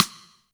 Boss DR-660 Snare, Drum Machines

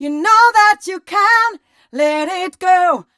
f mel e flat, fem Vocals; Mutekki Media; Diamond Vocals 2

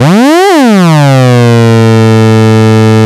MEMORYMOOG

